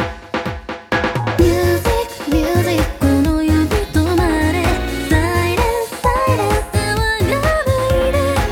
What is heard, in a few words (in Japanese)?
ハモリ処理後